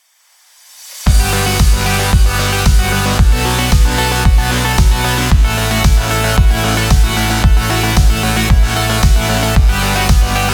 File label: hi tech and travel